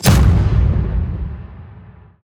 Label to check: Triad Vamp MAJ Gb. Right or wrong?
Wrong